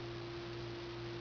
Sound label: night machine hum, ambience